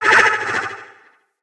Corrupted Slug Vocal Pain

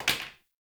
Plastic Broom Drop

